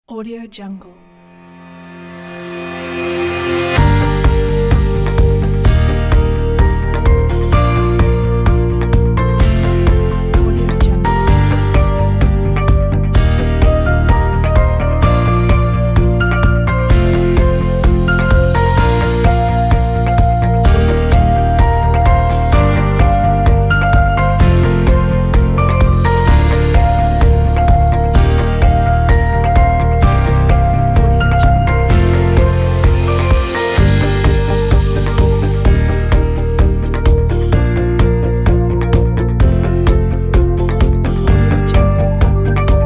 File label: corporate